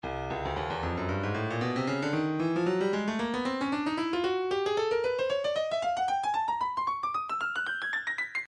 breakbeat midi 114 bpm; Bourbon Street C; Stylus Breakbeat Midi Files

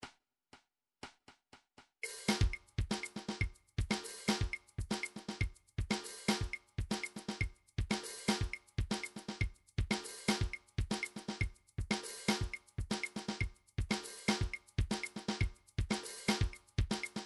world songo, midi; sonar

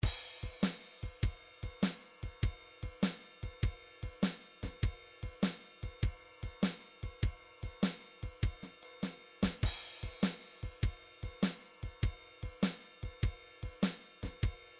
drums, midi drums

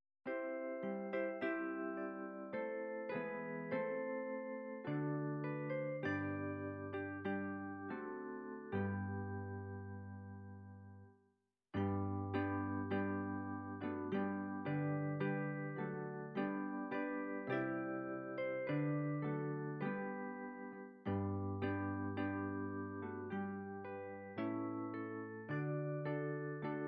classical, midi

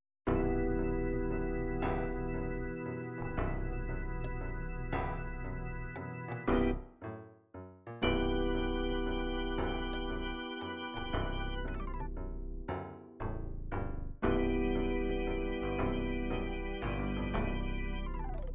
6 8 gospel, midi

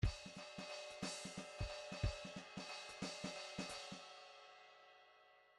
grooves 3 4

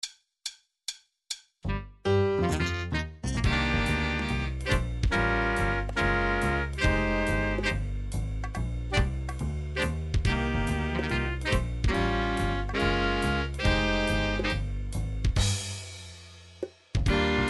big band, midi; song book

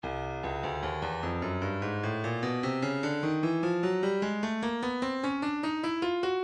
breakbeat midi files 075 079 bpm; Slip Knot C; Stylus Breakbeat Midi Files

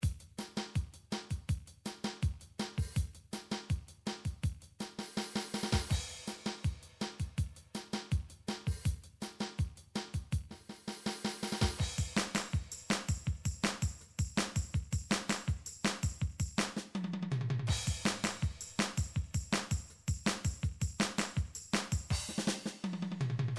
drum midi twist

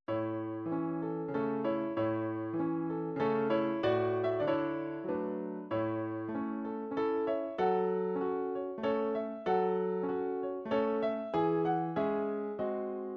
waltz, midi

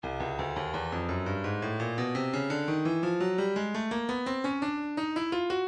breakbeat 085 bpm; Stylus Breakbeat Midi Files; sultrifier b

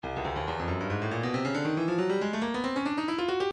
breakbeat midi, 138 bpm; Stylus Breakbeat Midi Files 130 139 Bpm

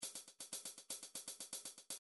funk cymbals 1 bar

